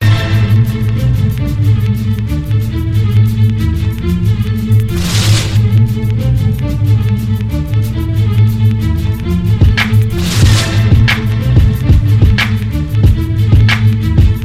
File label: beat